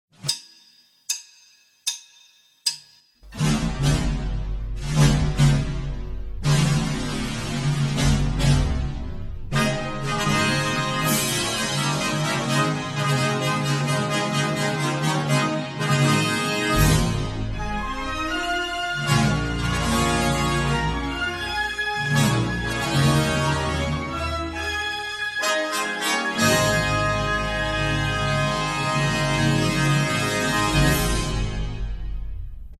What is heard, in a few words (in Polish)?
fanfara